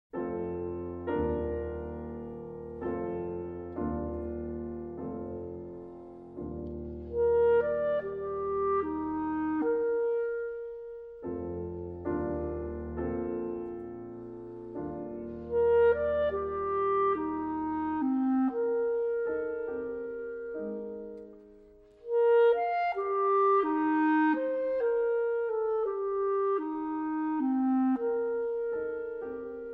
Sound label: Sonata for Clarinet and Piano - Mvt. 1. Andante semplice